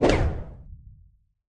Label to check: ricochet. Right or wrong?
Right